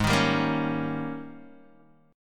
G sharp Major 7th Suspended 2nd Suspended 4th Guitar Open D